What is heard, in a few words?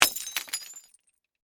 Impact Beer Bottle, Smash; Smashes (Sound Effects:Objects); BlastWave